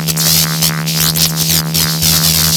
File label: shield use; WhiteOut